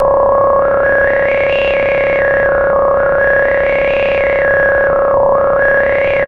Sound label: SFX, synth atmos & fx; Dream Zone